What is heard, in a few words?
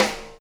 Snare Shard; ZeroGravity Drum Shards